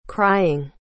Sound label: crying